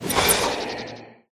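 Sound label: pterodactyl boss dodge; pterodaktilj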